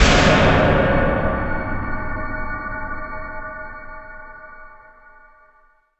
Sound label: Boss Outro; Insanely Twisted Shadow Planet